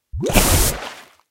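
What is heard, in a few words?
attack diesel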